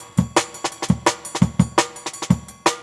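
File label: Jungle Breaks, 170 bpm; Pool; Original Jungle Breaks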